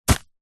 wood steak sharp post drive by hand into dirt through thick grass; foley